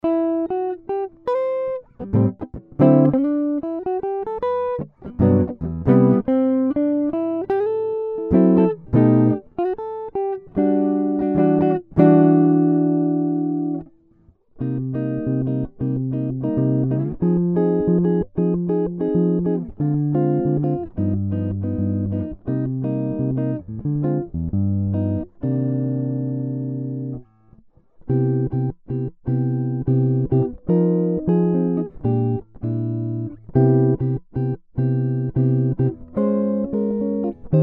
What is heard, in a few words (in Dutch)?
Jazz Gitaar